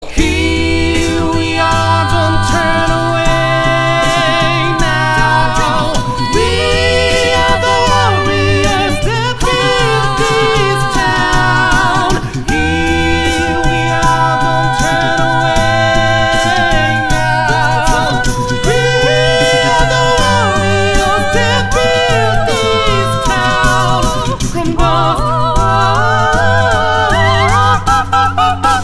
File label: League of Legends Acapella